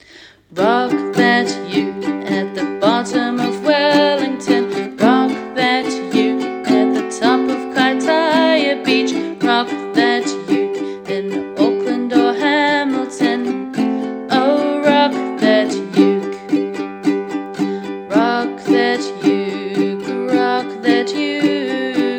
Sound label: rock that uke; Uke book